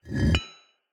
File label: Blink Cling